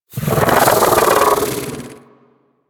bug vocal alerted; Warframe; Hunting Critters; Inf K Drive Bug

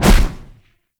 Dash Hit; Powers; Warframe; Focus; Operators

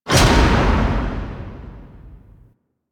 Gate Door Open Stop; Eidolon Ostron; Warframe; Ambience; Eidolon Plains

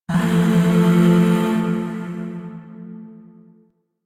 Shawzin Maj Chord F; Music; Chords; Warframe; Zariman Void Shawzin; DE

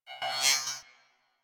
Beep Comby; Warframe; Ambience; Corpus; Beeps

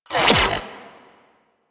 Split Laser Retract; Warframe; Corpus

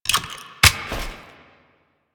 Sniper Reload Clip In; DE; Warframe; Tenno; Quad Sniper

